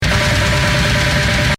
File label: blast